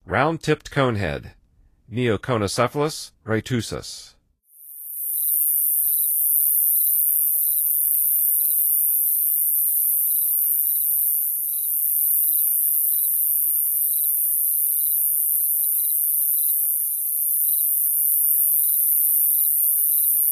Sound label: Round-tipped Conehead, Songs of Insects; N. America